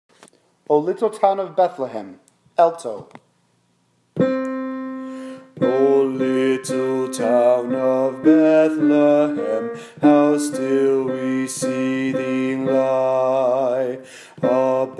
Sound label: alto oh little town of bethlehem